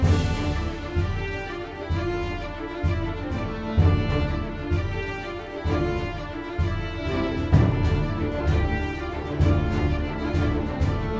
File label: Music Fight Pirates Theme Average Bpm128 Loop; asterix obelix slap them all 2